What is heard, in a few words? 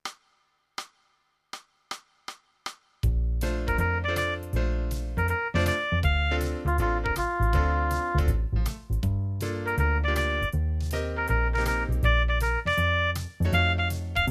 Jazzy